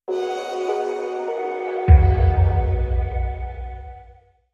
Sting

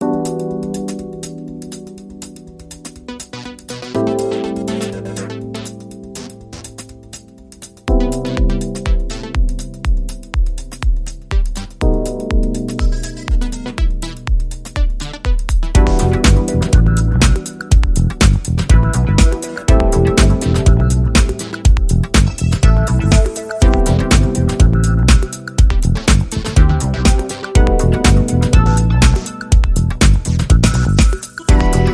Transistor Disco

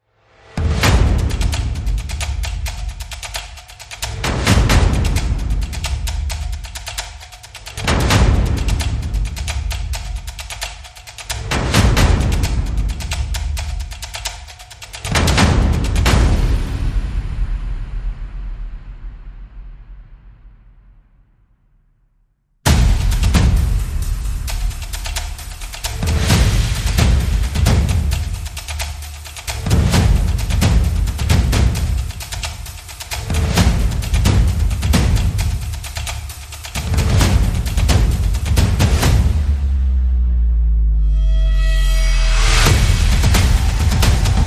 Percussion and FX

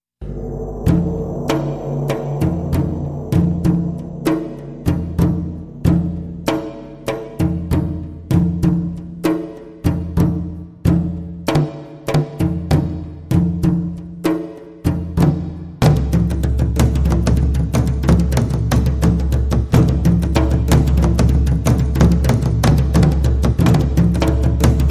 Taiko Thunder

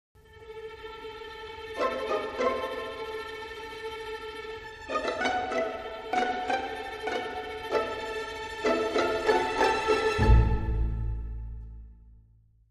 Dramedy Sting Five, Strings

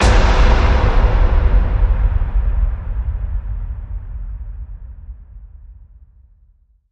hit big; Curious

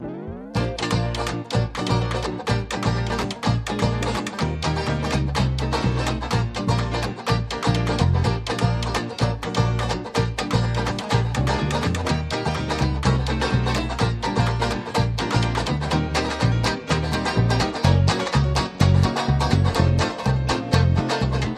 Flappin Charleston, Underscore

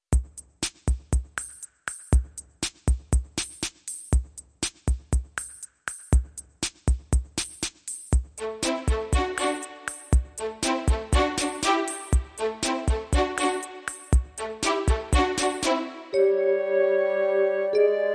Traditional Percussion Full

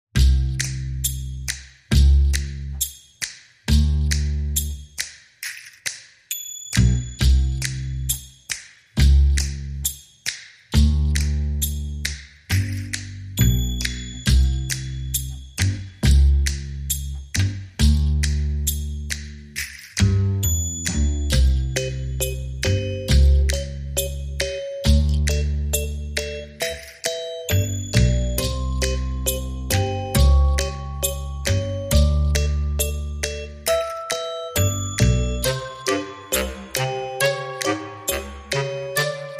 No Whistling No Ukulele

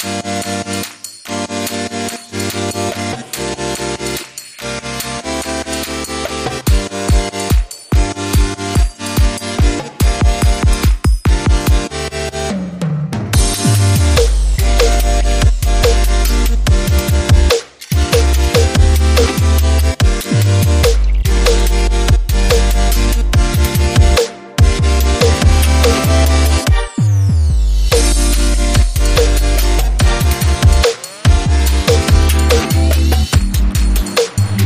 Underscore

